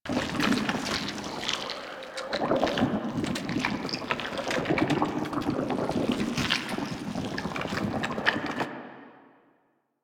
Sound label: destructable cyst loop; Ambience; Props; Warframe; Infested Eris